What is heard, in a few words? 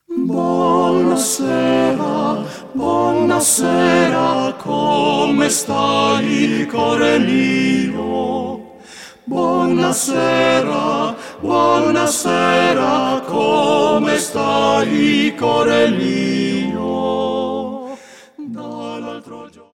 Voice Quartet; Bona sera, come stai cor mio